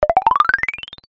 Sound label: cartoon climb fast steps ladder cute